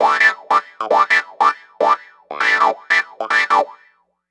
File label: Clavinet; Keys